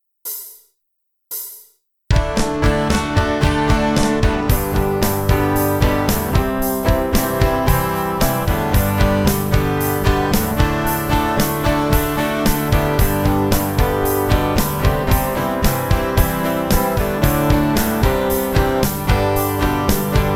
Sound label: acoustic; smorgasbord